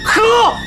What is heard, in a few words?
mag7; weapons; xieshen